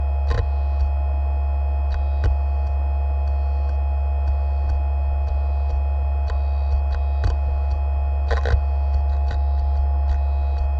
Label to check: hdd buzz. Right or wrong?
Right